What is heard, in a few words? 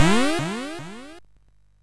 War Cars Construction Kit, MOS6581R3